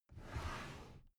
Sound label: drawer cabinet carton open soft halfway